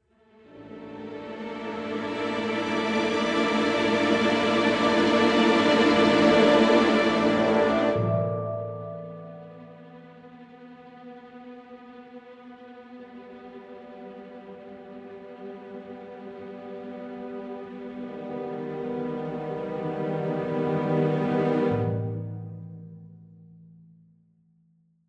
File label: Scored Music Bathroom; Agent A: A Puzzle in Disguise; Windows